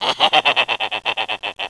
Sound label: LAUGH